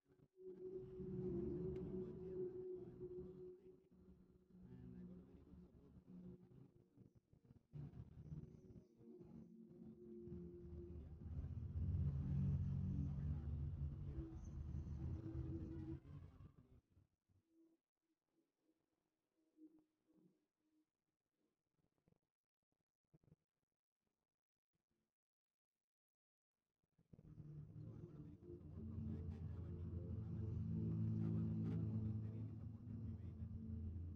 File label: guitar